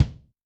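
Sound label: bass drum, Kick, Yamaha DTXpress-II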